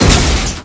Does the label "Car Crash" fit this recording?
Yes